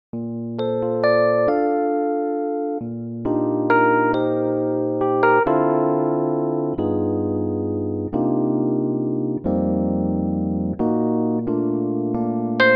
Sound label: electric piano Bb